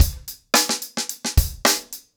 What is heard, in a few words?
110BPM, acoustic drums; The Roots Drumloops